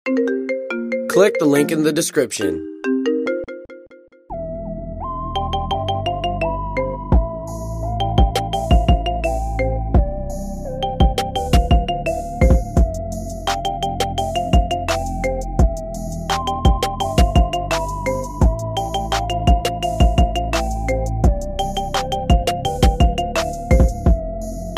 marimba remix ringtone

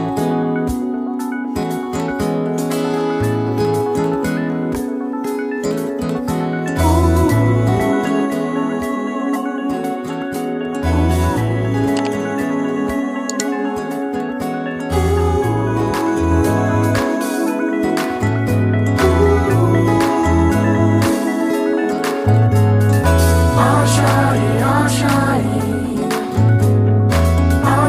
cartoon ringtone

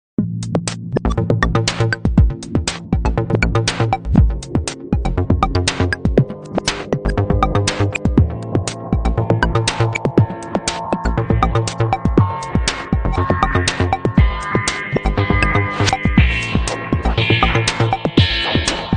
nokia n8 original ringtone

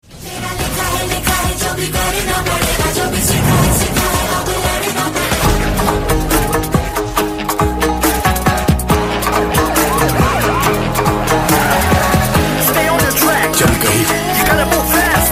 motivational hindi song tone ringtone; bollywood ringtones

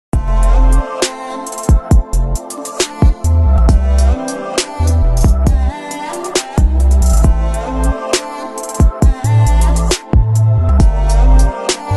mood off english ringtone

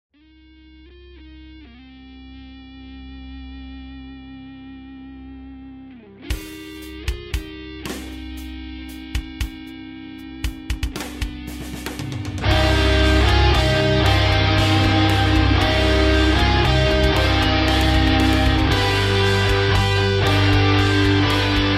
rock lovely tone ringtone

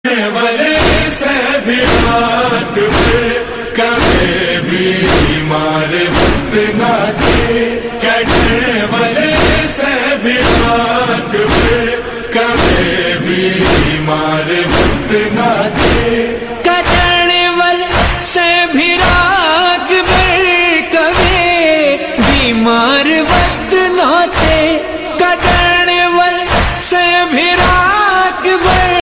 noha

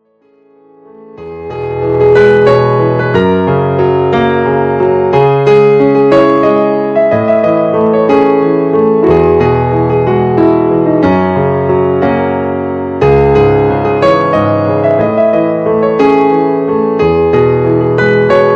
bollywood instrumental ringtone

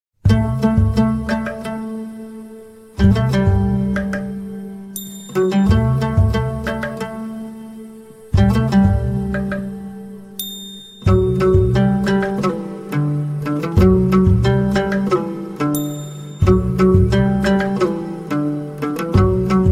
classical ringtone

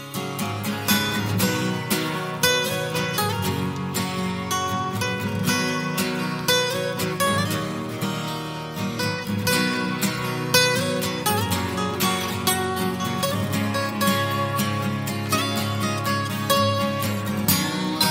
english music ringtone

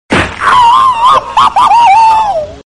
funny screaming tone ringtone

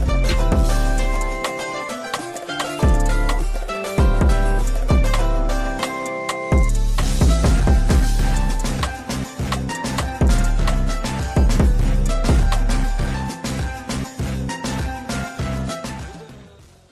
nokia 3310 ringtone

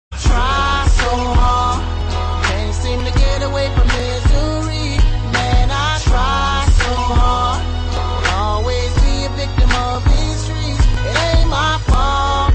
cool ringtone

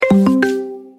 ringtone, apple sms; elara ringtone

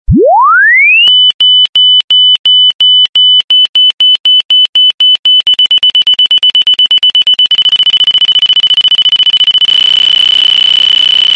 alarm tone ringtone

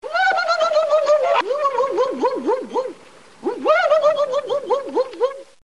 curly woob woob woo funny ringtone

